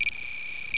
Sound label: restore down; StarTrek; Microsoft